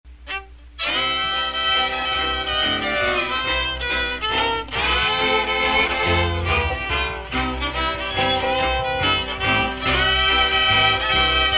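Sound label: My Waltz, field recordings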